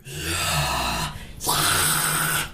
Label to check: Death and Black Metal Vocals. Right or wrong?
Right